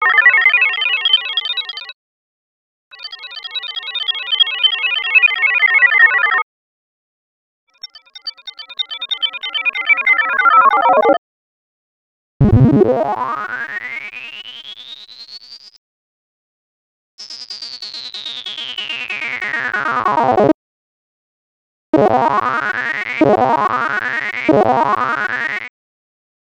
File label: Loop FX; X-Static Goldmine 5